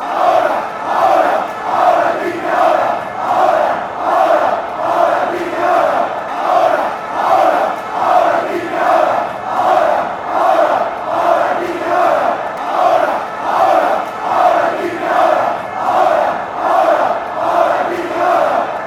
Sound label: ahora ahora, fan chants